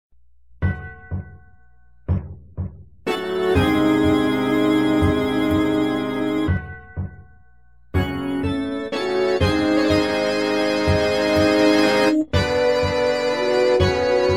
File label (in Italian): Musica castello; NSMB